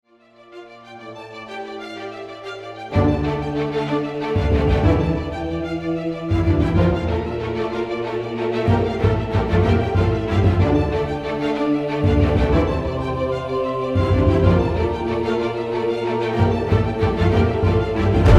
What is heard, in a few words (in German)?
Orchestermix kurz